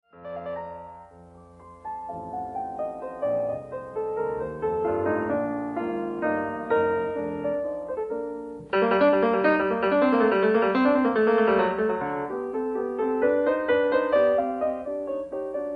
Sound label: Bagatelle for piano in G minor